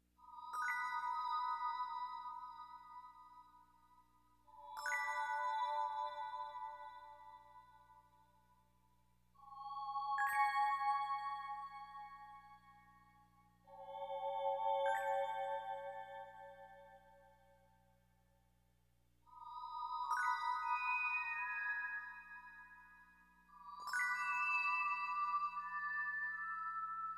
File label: Distant Tundra (Snowfall, Night); Pikmin 3